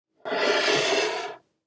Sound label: slide